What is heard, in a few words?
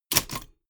typewriter